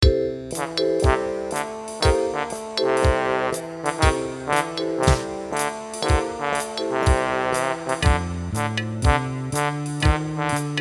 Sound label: MIDI blues